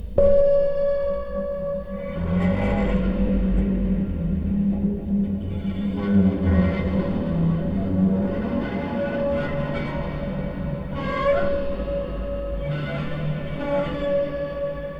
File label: Table Guitar Wet